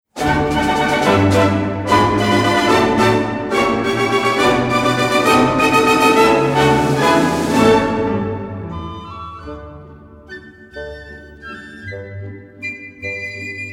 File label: waltz